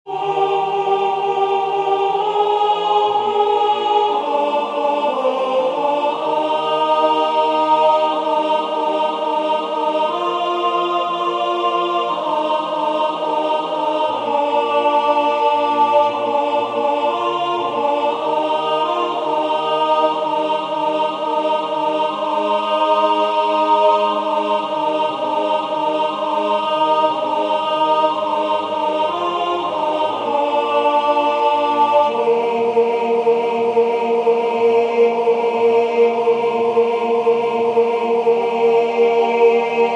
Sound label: EcoWarriors alto